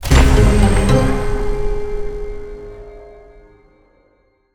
win large level; netent

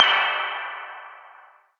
count Up Loop End

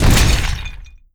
win Refill Heavy; netent

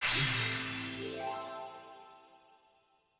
small win

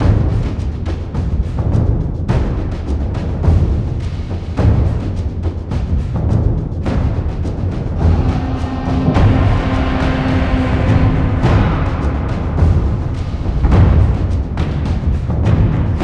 free Spin Amb; netent

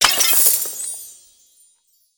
bonus Bomb Coins; netent

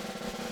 snare Loop; netent

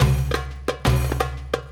spingle Drum Loop; netent

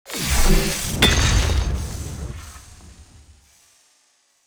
scatter unveil hammer; netent

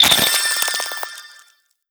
cocoon hatch; Stikky; netent

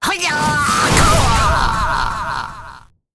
Green Heavy Attack; netent

